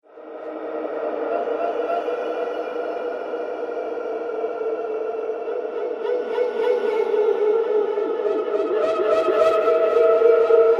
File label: Screams Horror Atmosphere, scary soundscape